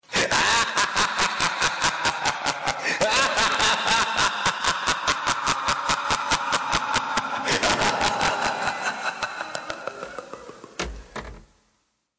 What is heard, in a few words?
Laugh